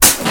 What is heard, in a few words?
carwash start